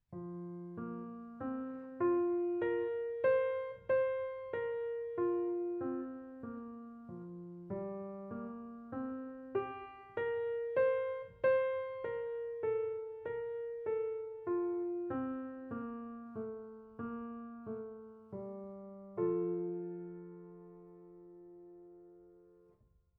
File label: piano